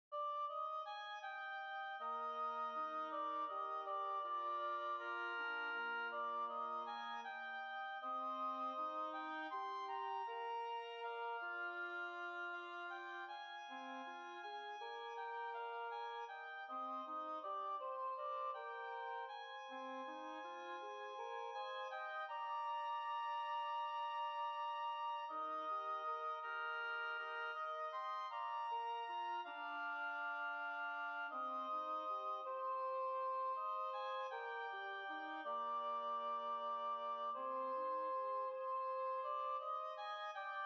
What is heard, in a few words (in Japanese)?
オーボエ三重奏